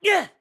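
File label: Jump Attack, voice; Link; BOTW; Breath of the Wild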